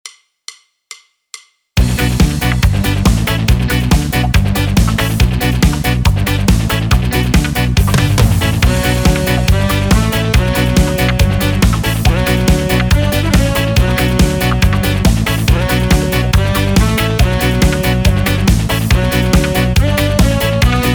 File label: Style Yamaha; yamaha psr s970